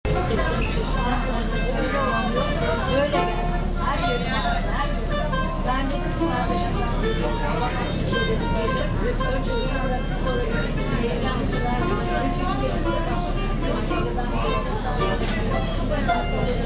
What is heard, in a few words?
boat minstrel